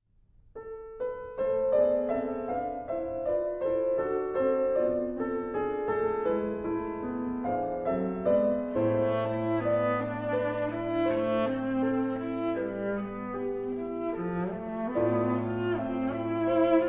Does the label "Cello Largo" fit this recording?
Yes